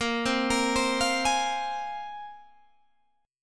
Bb 7th Suspended 2nd Bb Guitar Open G, chord

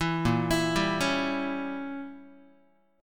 Bb Diminished 7th Guitar, Bbdim7 chord; arpeggiated; JGuitar

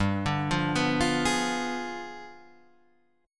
G 7th Flat 9th

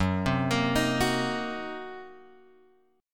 F sharp 6th Flat 5th; F#6b5 Chord {2 3 X 3 4 2} arpeggiated

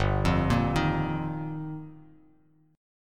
G Minor 7th Sharp 5th, Bass Standard; Gm7#5; arpeggiated; JGuitar; 3,8,8,8

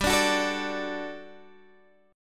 G Major 7th Suspended 2nd

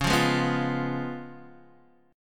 C sharp 7th C sharp, Guitar Open D

